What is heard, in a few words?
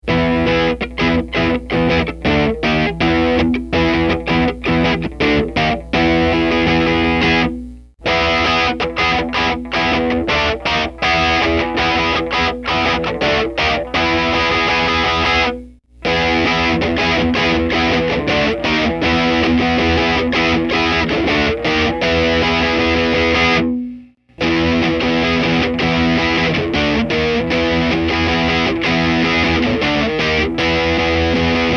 omega thor, bypassed, min. Range, 50% Range, max. Range; Clips 1 and 2: Tele br